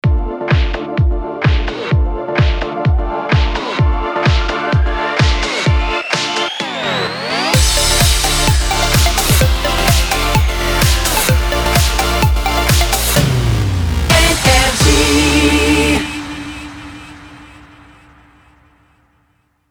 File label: Jingle Ramp; NRJ